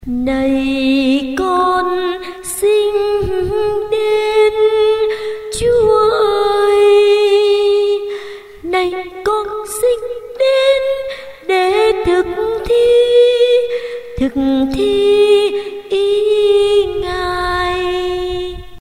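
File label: soprano tenor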